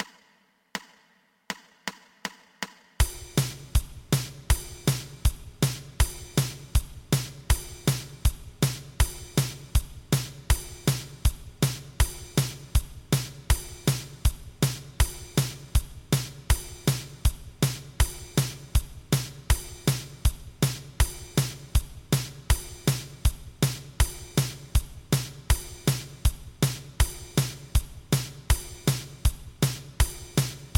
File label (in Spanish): 2 pulsos